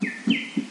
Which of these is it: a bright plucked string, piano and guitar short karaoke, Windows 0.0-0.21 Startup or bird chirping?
bird chirping